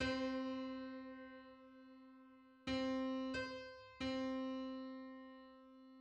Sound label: Sixty-fifth subharmonic on C